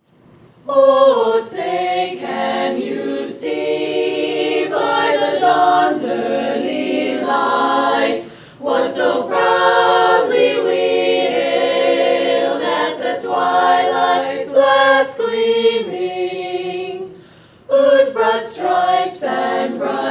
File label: National Anthem